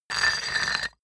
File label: stone tile scrape high